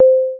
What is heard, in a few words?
dong; snowboy